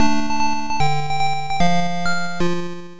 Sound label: jingle level end